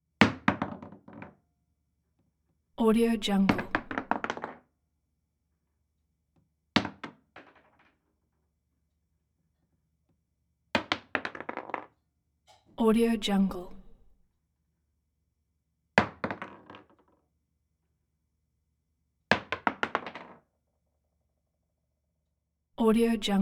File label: pumice rock drop through floor; game; ریزش خرده سنگ از طبقات